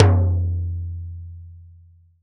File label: TIMBAL LO OP; CUBA